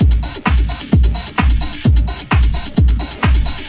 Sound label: 130 bpm brainfry house, drum loop; 121 to 130 bpm